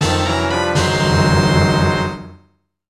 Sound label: Item Obtained!; Mario & Luigi: Superstar Saga + Bowser's Minions